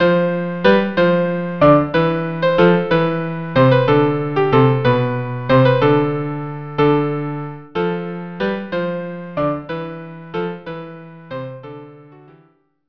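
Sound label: estampie viola cello violin cello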